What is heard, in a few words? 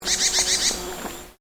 Bird Call, Unkown bird species; Animals; SoundEffects-Foundation1